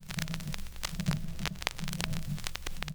Record Start Old, Vinyl Record; Record Noises

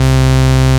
Basses Root C; Vengeance Samples

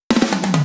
Fill 140 BPM; Vengeance Samples; VEC3 Loops 140 BPM

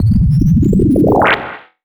FX Scratching; Vengeance Samples

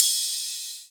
Ride, Cymbals; Vengeance Samples; VEH2

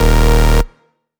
Bass Cuts; Vengeance Samples; VES1 Instruments

